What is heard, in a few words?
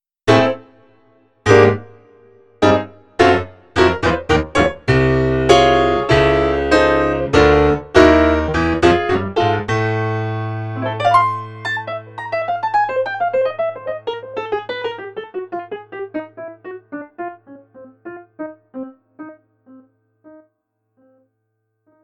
pseudo-acoustic piano spasmodicism